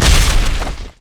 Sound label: crit attack; LoL; tryndamere